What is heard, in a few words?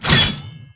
medium sword